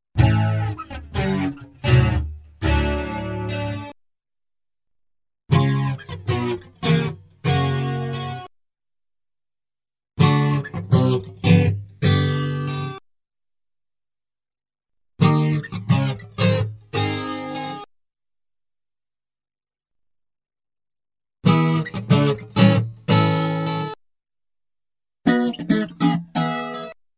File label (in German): Guitar Vergleich